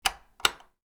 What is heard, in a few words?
switch